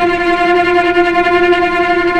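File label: cellos tremolo F4 piano left, string; East West - The Ultimate String Collection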